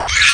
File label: PAIN, aliens chestbuster; svencoop